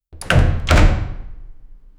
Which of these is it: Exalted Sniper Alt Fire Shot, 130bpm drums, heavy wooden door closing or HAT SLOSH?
heavy wooden door closing